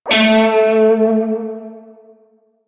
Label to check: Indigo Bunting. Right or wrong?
Wrong